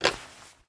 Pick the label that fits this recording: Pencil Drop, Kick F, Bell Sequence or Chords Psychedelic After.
Pencil Drop